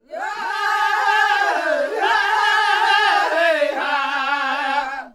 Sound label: Dine group vox